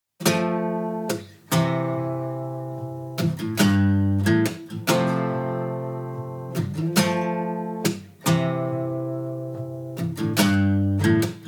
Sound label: acoustic